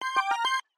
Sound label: success; videogamebundle; soundscrate